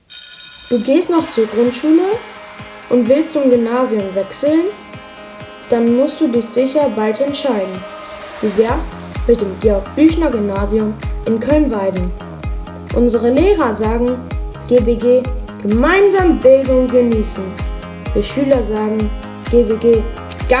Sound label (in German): Musik; GBG Werbespots